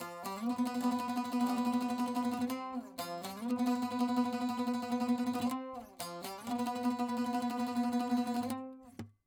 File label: saz